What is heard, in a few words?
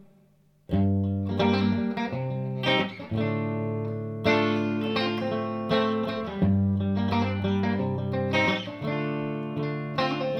truevintage 5 positions, guitare; set TRUE VINTAGE